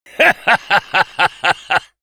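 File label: laugh happy, French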